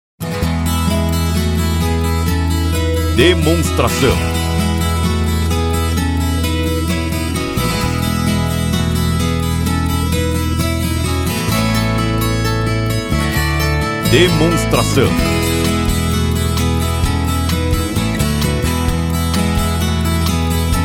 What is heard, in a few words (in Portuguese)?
Trilha